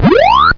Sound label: eat ghost, pacman